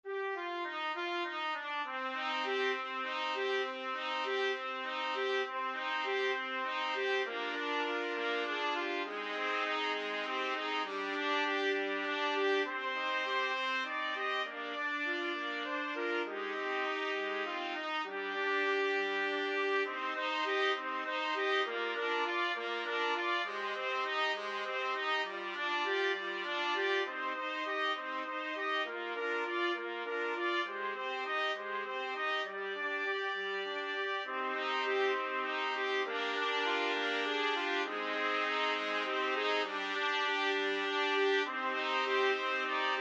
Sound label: spanish violin 5 TPT, trumpet ensemble